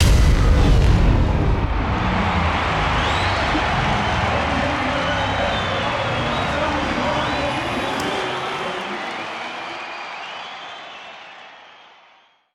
Race Start; Racing; lego universe macos windows gamerip 2010

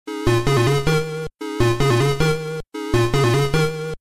Game Over; Tetris; MSX

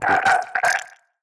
Death Vo; portal quest android; Shadow Minion3

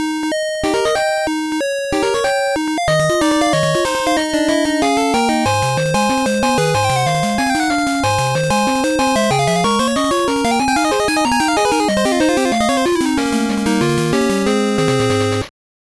BGM

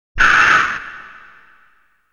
Enemy Voice (Large Bird)

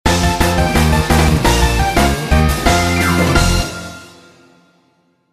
BGM Result Clear

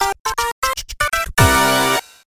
Jingle Victory; Mister Slime

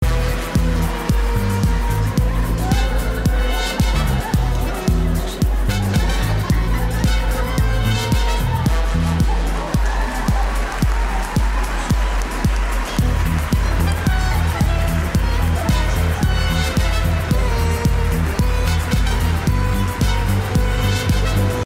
Cutscene Cheery; dungeons halloween special unofficial soundtrack; android ios macos ps4 switch windows

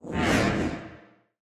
Attack Whoosh; portal quest; android; Magic Shrek

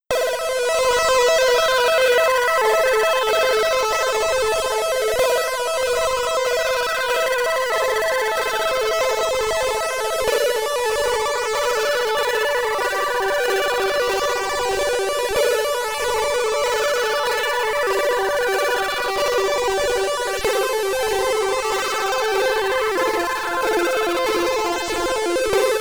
SID Stereo; brain artifice; commodore 64

